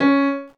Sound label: VOC PIANO